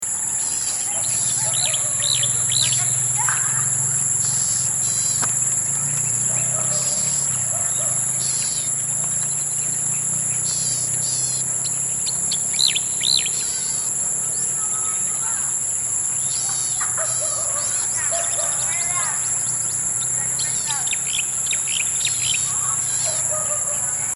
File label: Golden headed Cisticola; Banos